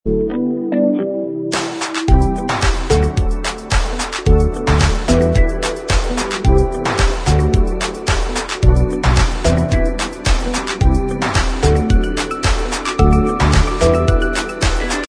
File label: Inst